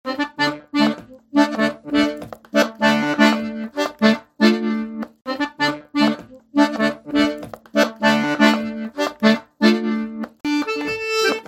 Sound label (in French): Rond Melodie; Ronds Du Quercy